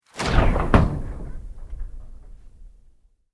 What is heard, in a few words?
Sweep Mechanical Medium